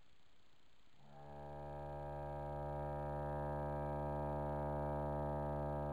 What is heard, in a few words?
bass D